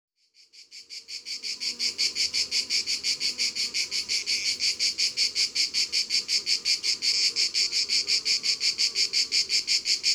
Cigales, Ardeche; Ribes; Ambiances Sonores d'Ardeche; Studio Les Trois Becs

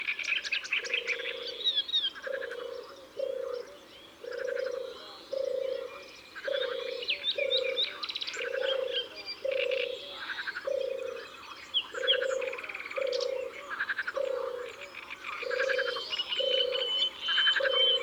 Tourterelle des bois; Drome; Concerts Naturels de la Drome; Studio les Trois Becs

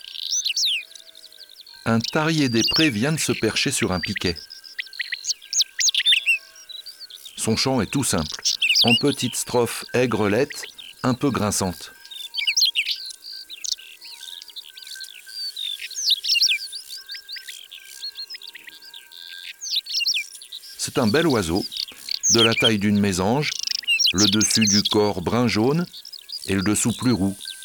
Tarier des pres; ABC de la nature

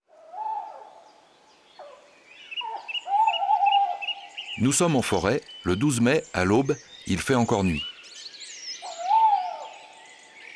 Chouette hulotte; abc de la nature

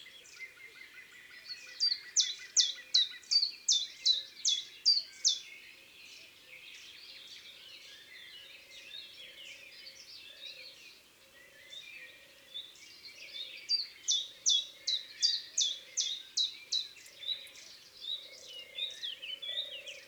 Pic vert, Chants d'oiseaux des bois et des forets

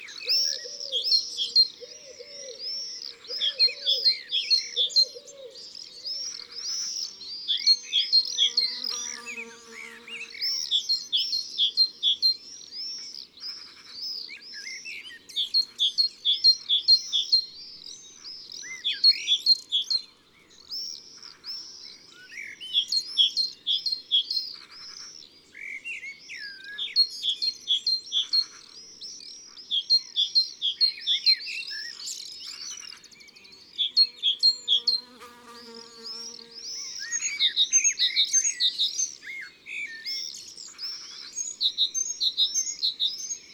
Mesange charbonniere, chants d'oiseaux; ABC des chants d'oiseaux

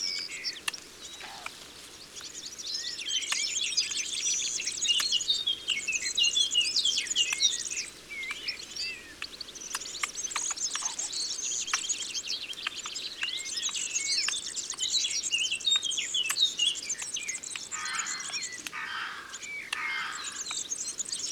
Ecureuil roux, Concerts naturels de l'Isere; Studio Les Trois Becs

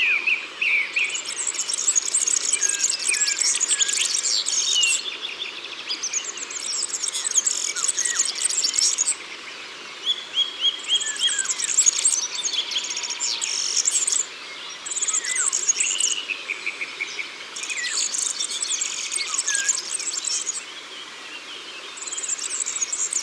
Serin Cini, Concerts Naturels De l'Ardeche

